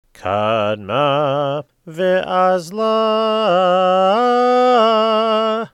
Torah Trope Kadma Vazla

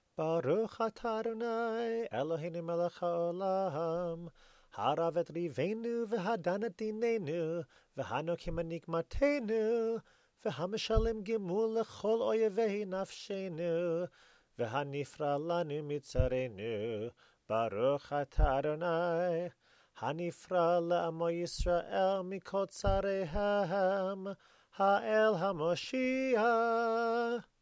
blessing after megillah

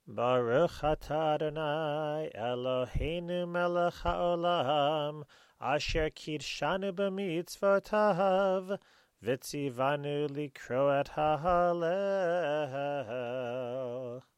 blessing before hallel

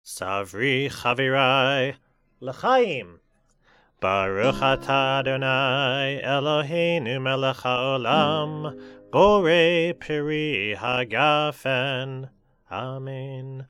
Friday Night Kiddush

